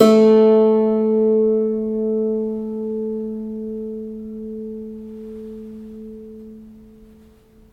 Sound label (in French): Note Piano